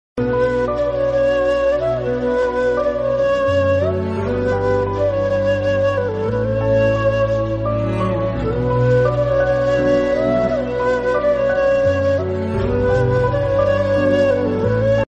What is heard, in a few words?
Instrumental Tone; Kabir Singh